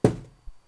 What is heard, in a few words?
Briefcase drop; Phasmophobia